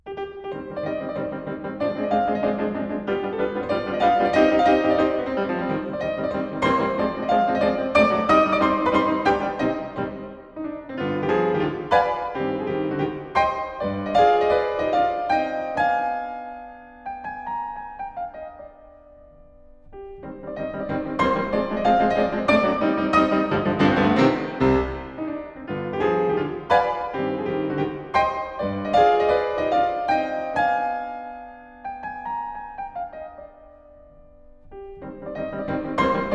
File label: C major, Menuet; 12 Variations; ''A la Vigano'' by Haibel WoO 68 - Var. 3; Ludwig van Beethoven - Complete Works [Brilliant Classics 100 CD Box]; Piano Works